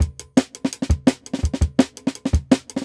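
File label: Jungle Breaks, 168 bpm; Wool; Original Jungle Breaks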